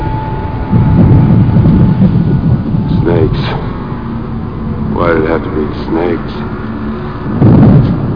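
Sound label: indy, racing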